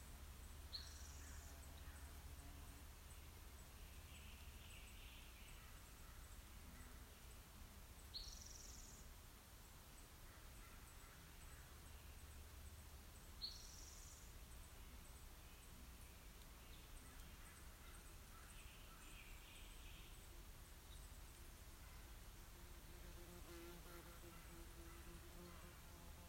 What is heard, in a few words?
Birds and crickets